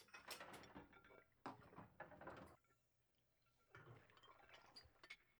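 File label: ambience generic sounds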